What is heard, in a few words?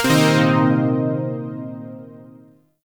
Shawzin Blues Chord; Music; Warframe; Zariman Shawzin